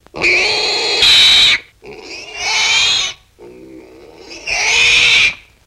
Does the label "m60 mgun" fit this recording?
No